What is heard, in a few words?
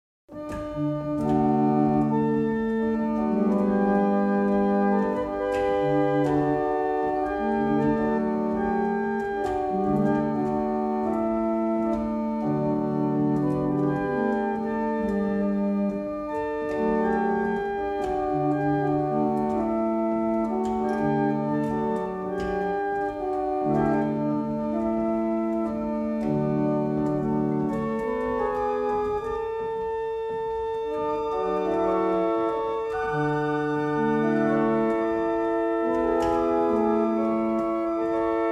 Hymn, barrel organs; Wardley; Easter Hymn